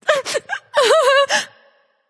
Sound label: boo hoo; daji